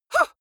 female fight huh short; vocals; comedy-cartoon; soundpool

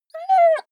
dog whine; natur-tiere; hunde

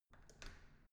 entrance door handle up soft

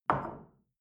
shell place on table soft short; berufe; gastronomie; soundpool

